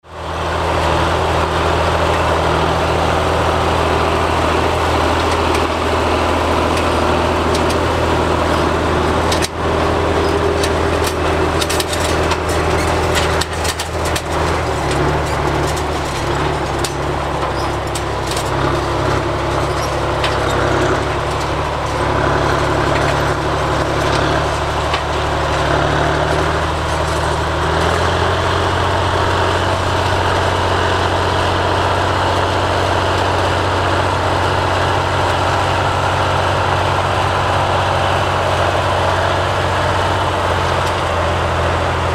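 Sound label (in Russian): трактор работает в поле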